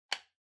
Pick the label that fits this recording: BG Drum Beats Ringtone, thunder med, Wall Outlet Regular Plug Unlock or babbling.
Wall Outlet Regular Plug Unlock